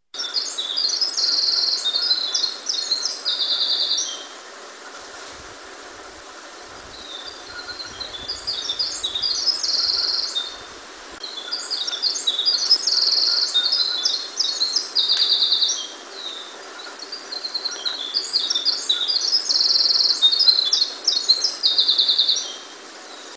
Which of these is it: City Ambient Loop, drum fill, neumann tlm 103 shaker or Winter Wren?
Winter Wren